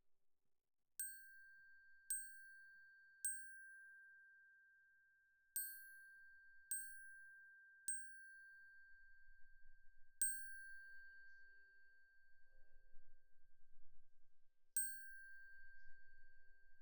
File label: Glock Close